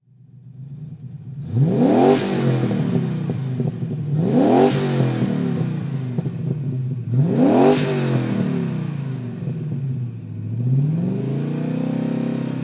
tvr chimaera 4L throttle; TVR Chimaera 4.0, 1999; Engine sound; V3D/ ClassicarImages; Marc Vorgers

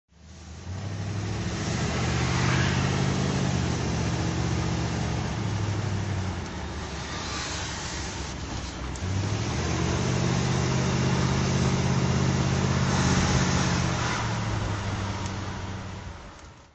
facel fv3b drive

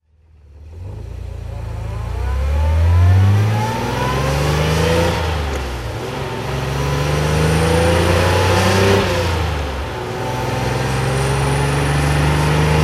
healey 3000 mk2 3c driving

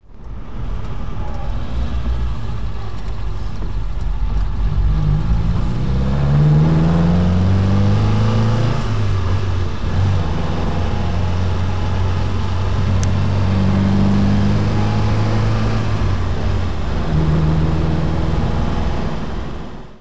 giulietta ss drive

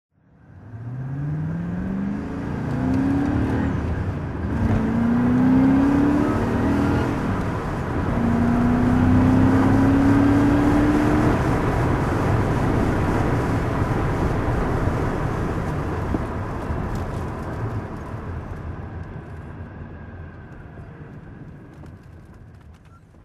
tvr chimaera 4L driving, TVR Chimaera 4.0; TVR Chimaera 4.0, 1999; V3D/ ClassicarImages; Marc Vorgers

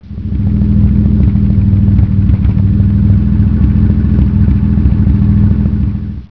healey 3000 idle

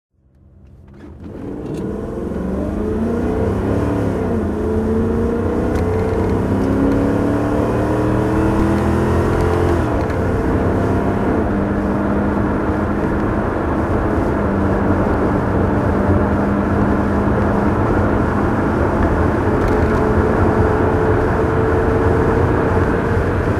aston martin v8 coupe drive

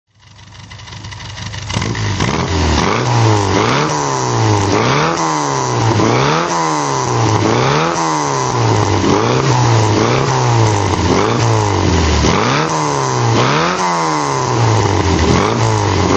sprite throttle

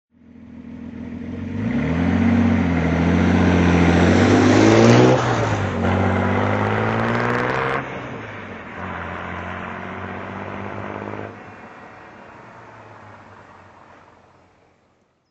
healey 3000 mkII drive by

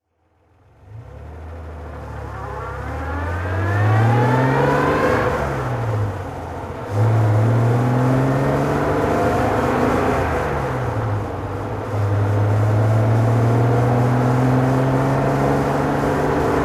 austin healey 3000 mkI driving

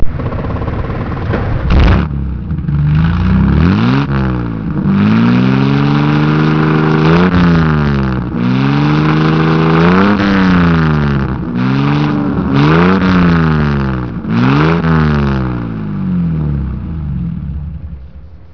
alvis

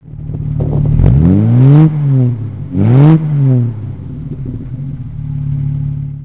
jag xk120

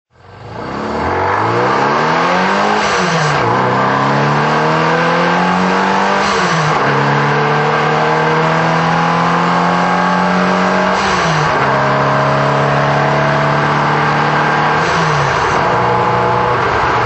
austin healey sprite tuned driving

